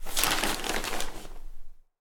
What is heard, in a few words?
Prisoner Page Turn; prison architect; linux macos windows gamerip 2015